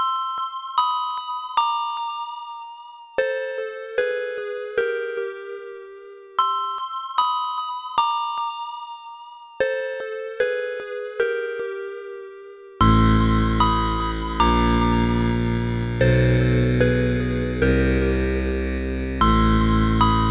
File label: Music - BGM; fushigi no umi no nadia the secret of blue water; pc engine cd; turbografx 16